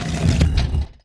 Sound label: stone stop